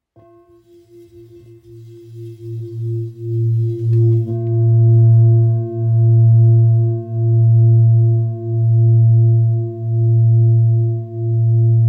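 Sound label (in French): Bengale fa 2.245kg, Bol Chantant Tibétain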